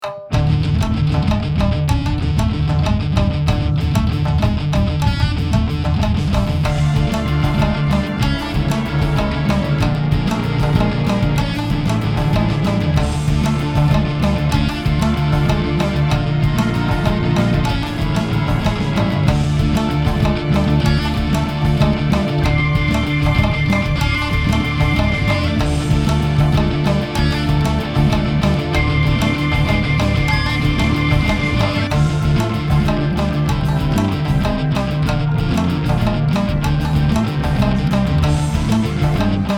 shamisen battle

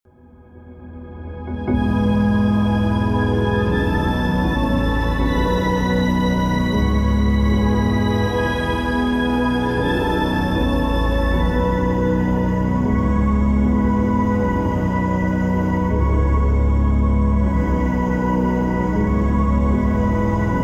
suite for electric organ and electronic choir; second movement door

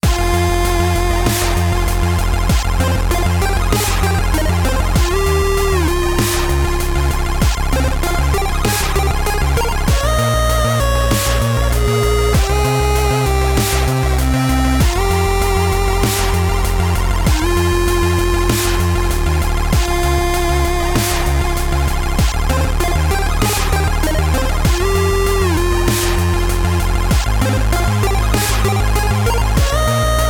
pulse wave; weeklybeats 2024

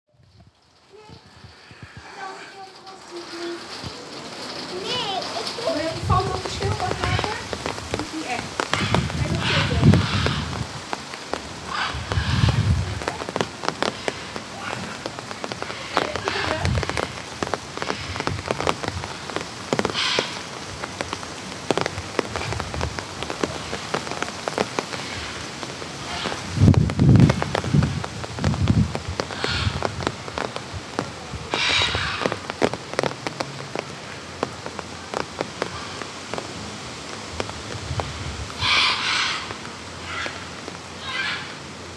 zoo rain

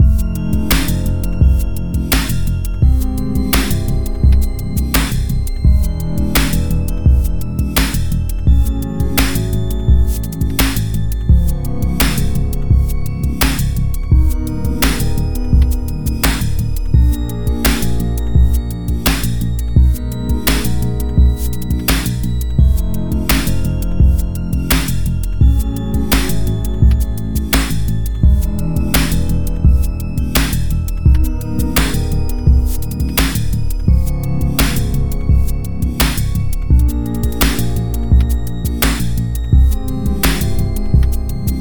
boom bap; weeklybeats-2020

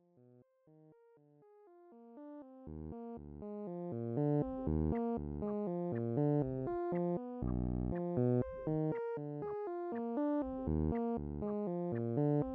beep dup; weeklybeats 2026

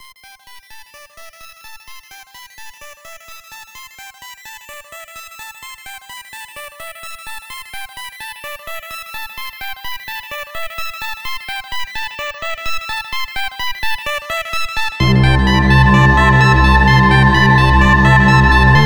ambient sketch; weeklybeats-2020

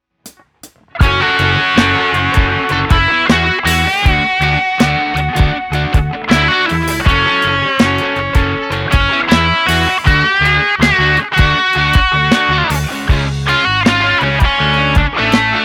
drum practice; weeklybeats 2024

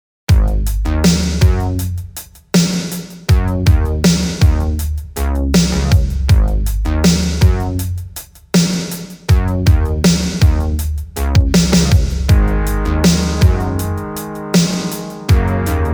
synths roland juno 106

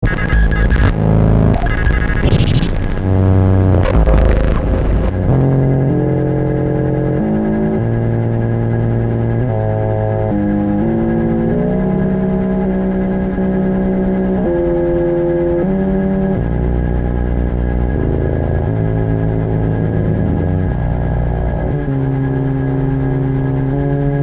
glitch; brain switch; weeklybeats-2014

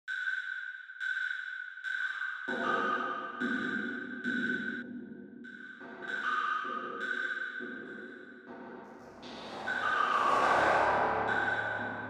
perc; weeklybeats-2016

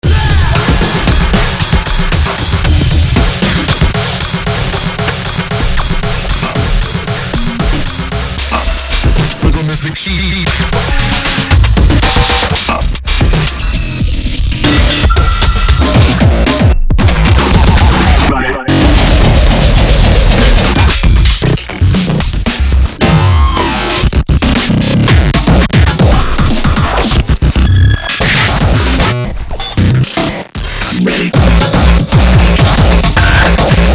freq out 230bpm; weeklybeats 2026

